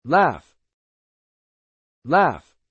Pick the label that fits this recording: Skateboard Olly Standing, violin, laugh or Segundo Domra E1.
laugh